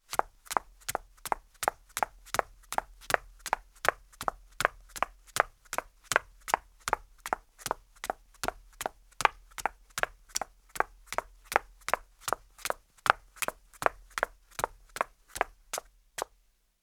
Footsteps Heels Concrete Run

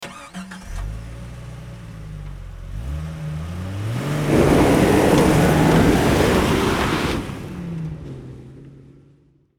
Auto SUV Pull Away Gravel

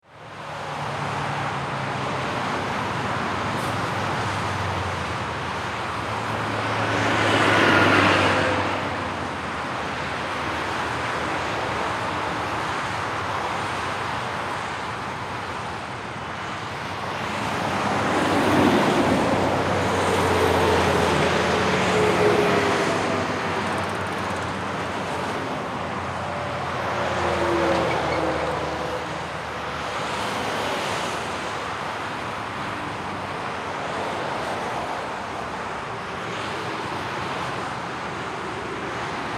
Traffic Highway

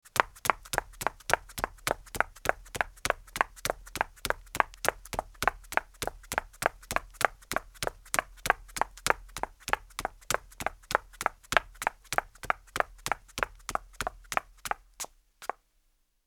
Footsteps Heels Concrete Run

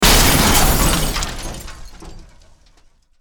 Auto Crash Concrete

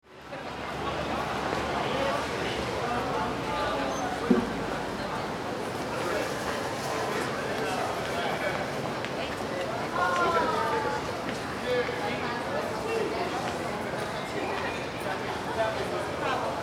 Crowd Walla

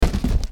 Crash Cardboard

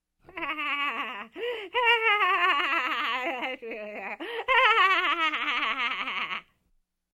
Witches Evil Laugh

